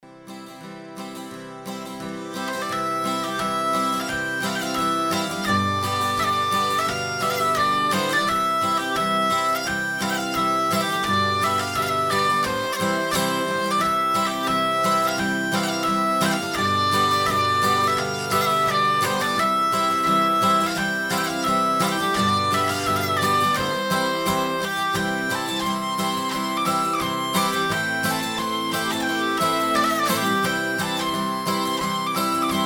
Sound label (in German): gitarre; bauer schildt